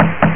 knock knock; Theldrow

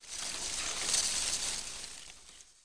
A leaf2

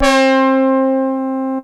brass, synths

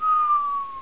whistle; Skittles 1.0.1

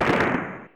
ball impact; wob2 demo

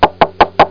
Knock; Curio Strip

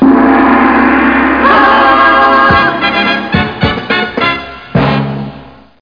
Gong; TileMix

